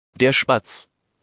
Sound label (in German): Spatz; wildvogel